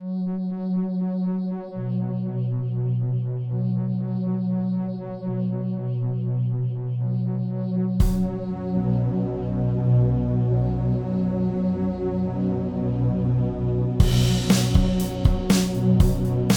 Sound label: Monster Riff